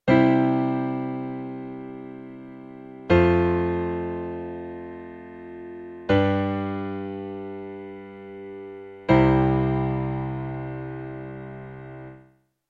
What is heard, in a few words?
piano just tuning